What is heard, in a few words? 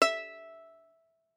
Violin pizz ff sul E E5; MIS Pitches - 2014; Strings